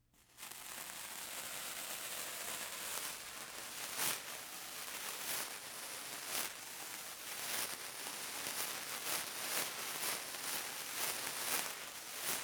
Sparkler Fast Whoosh; Fairlight Sound Lib

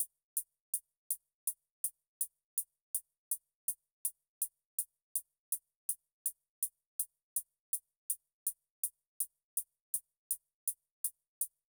Drum Loop 163 BPM Hihat, Trap; Blizzard; Cymatics; ANALOG EVOLUTION Beta

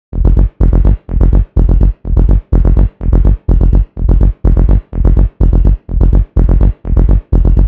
Bassline 125 F; Loops; Pressing

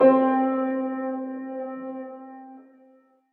KEYS Real (C), Melodic One Shots; Cymatics; ANALOG EVOLUTION Beta

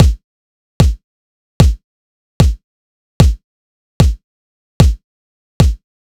Dubstep Drum Loop Kick, 150bpm; Full Drum Loops (Stem Seperated); Ghosthack; Dubstep Sound Arsenal

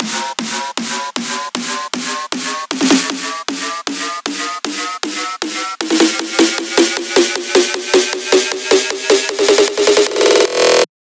buildup loop 155; GhostHack

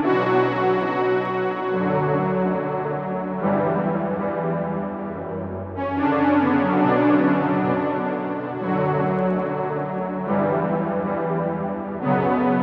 140 BPM B Min Synth; Loop Stems; Cymatics; Utopia

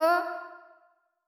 Wet One Shot - F, Vocal; Tonal One Shots; Cymatics; Euphoria Vocal Sample Pack

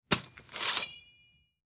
Machete Grab and Out; Fairlight Sound Lib

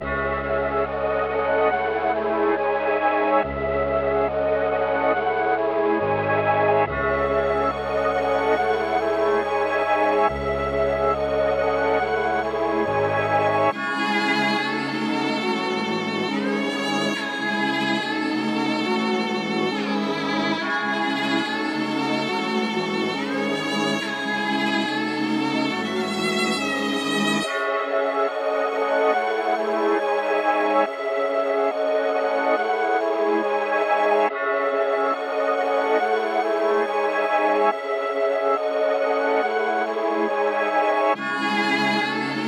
140 BPM C# Min, Drill; Authority

